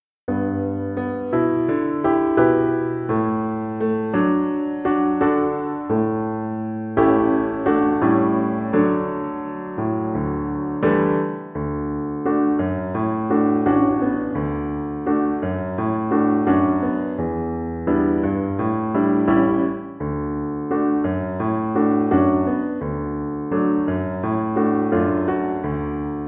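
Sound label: VLC karaoke, Cello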